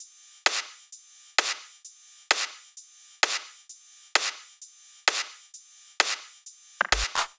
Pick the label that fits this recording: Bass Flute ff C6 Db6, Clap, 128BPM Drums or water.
128BPM Drums